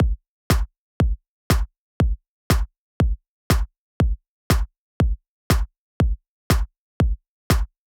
kick snare

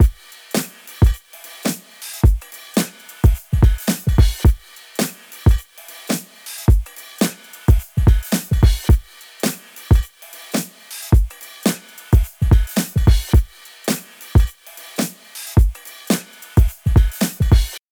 Dive Break Looped

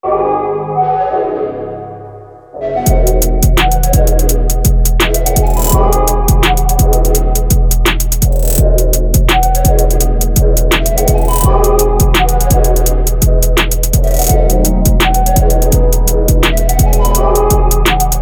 Trap Beat High Open; LANDR

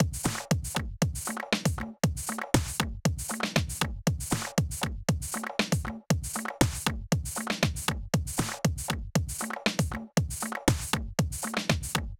compressed mix

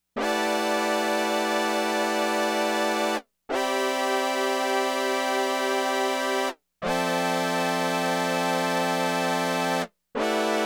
Dry richSynth

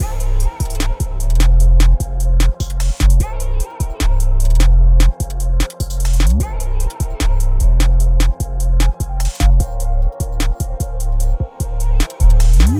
Excessive Low End Mix

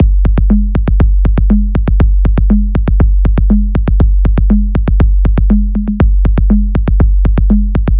loop stack; LANDR

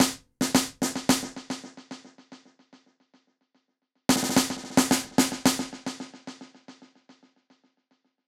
Snare Delay

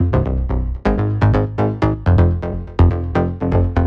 Doubling Bass After

